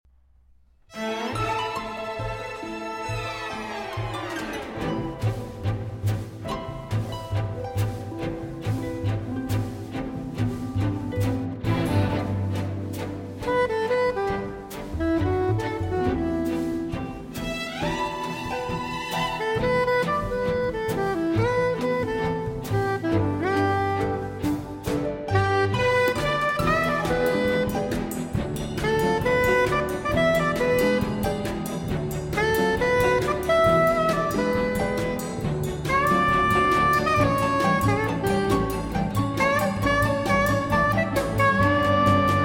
Arr. For Violin & Orchestra

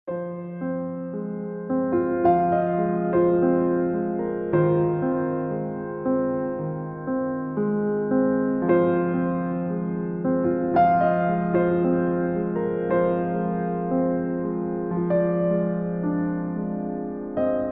String Quartet

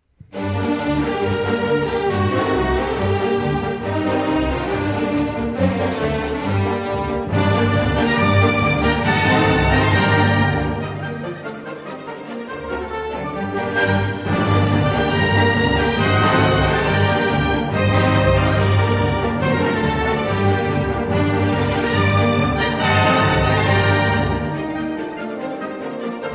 Allegro in F-Sharp Minor; 21 Hungarian Dances, WoO 1 No. 5

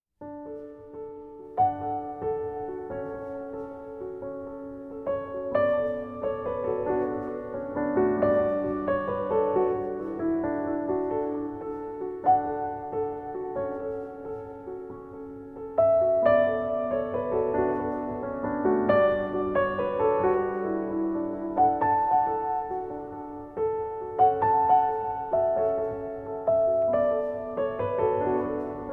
Solo Piano Version

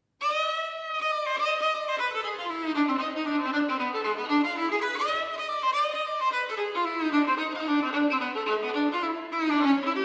Gypsy Jazz; Variations on Paganini's Caprice No. 24 in A Minor

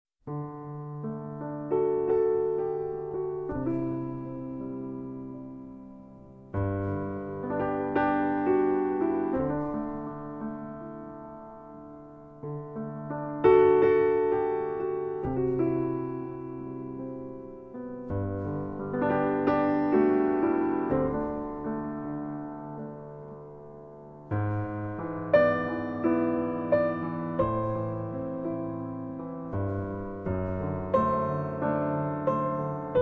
piano, Live

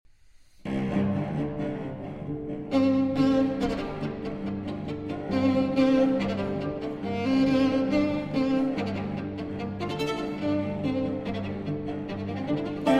Duo For Violin & Cello; II. Zingaresca

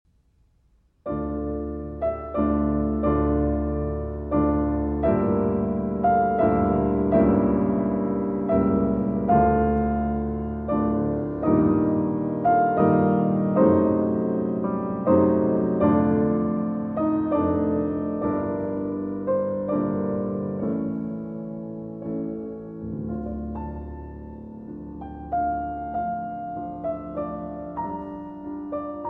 Sicilienne, Concerto in D Minor; BWV 596

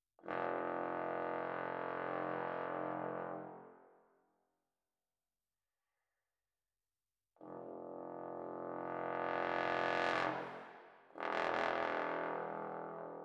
Trombone Quartet

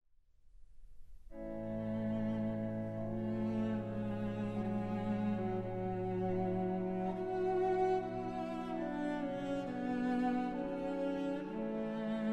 Chamber Orchestra, Choir

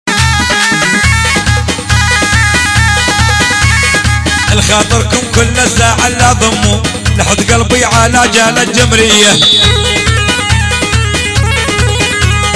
Live Arabic Dabke

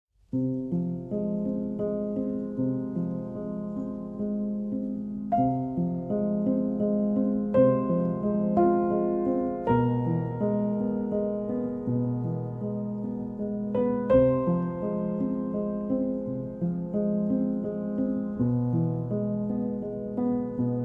Piano Solo

